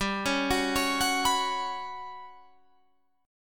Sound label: G Major 7th Flat 5th G down Guitar Drop D